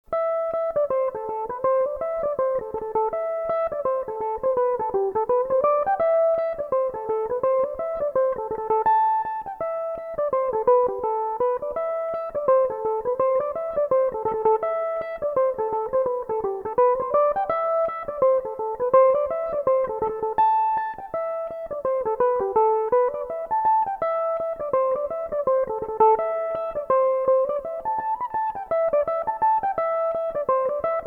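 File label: reel A dorian, irish mandolin, tunes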